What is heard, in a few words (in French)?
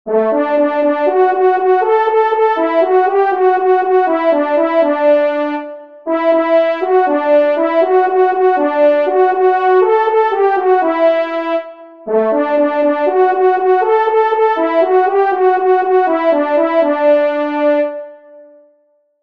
Cerf Fanfare du Roi Solo; Fanfares imposables en Concours